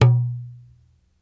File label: Dumbek Med, World Percussion One Shots; Sony CS